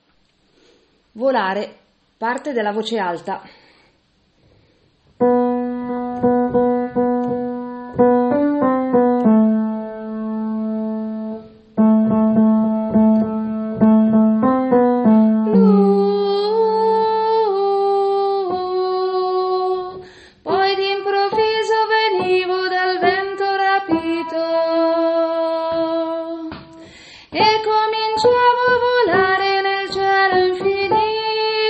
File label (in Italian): voce alta